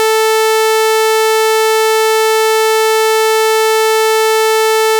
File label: sawtooth